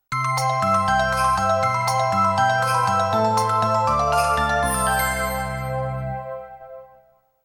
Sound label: Babbling Brook